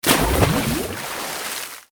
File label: bubble; One Shot Fishing